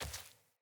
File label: Sandals Walk Dirt, Footsteps